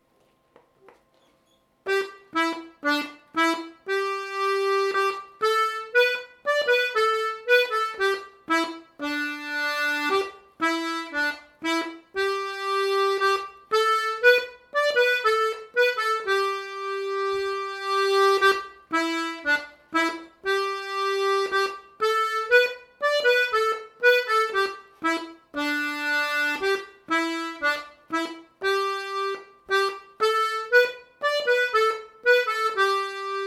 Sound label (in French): Polka ecossaise lent